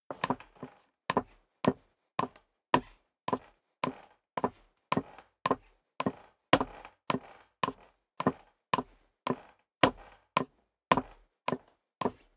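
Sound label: step dress boots wood creak upstairs sequence, footsteps; schritte; menschen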